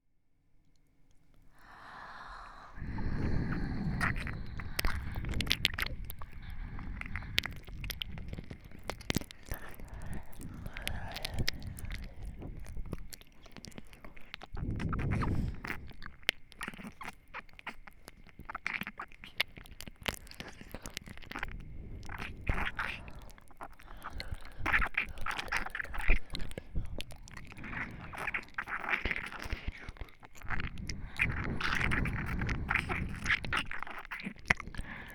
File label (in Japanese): 耳たぶ舐め（両耳）